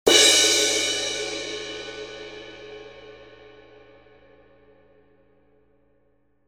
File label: 18in Dark Crash Thin